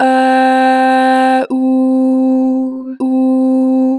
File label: Ah Ooo, Harmor Vocals; 2 bar flat; Pitch Flat